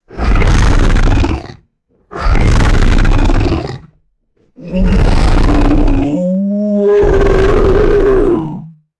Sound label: ascending throaty creature growl and rumble